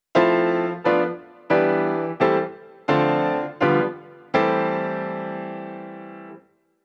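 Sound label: IONIAN C QUINTFALL SEQUENCE Fmaj7 Hm7b5 Em7 Am7 Dm7 G7 C6 trumpet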